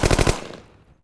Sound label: Gunner Fire; Civ4 warlords